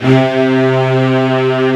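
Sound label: strings section C2 right; AKAI S-Series CD-ROM Sound Library VOL-8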